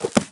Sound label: Spell Book Closing; Live Realm